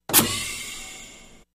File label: Mechanical Door, pneumatic